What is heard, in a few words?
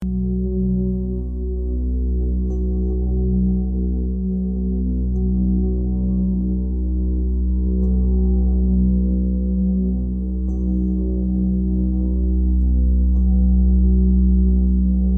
285 hz 639 hz 852 hz; harmonizing energy enhanced relationships